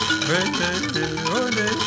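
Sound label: PLK Bass Mbira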